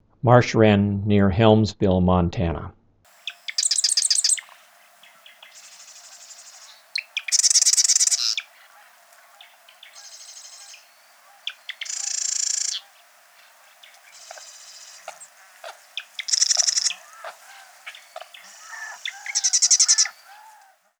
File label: wren marsh